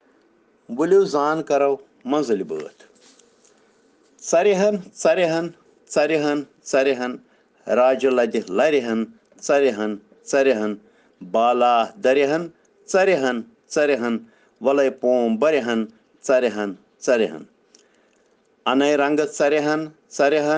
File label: Children Rhyms in Kashmiri